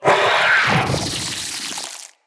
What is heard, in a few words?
Spider Elite Vocal Attack; Root Spider Elite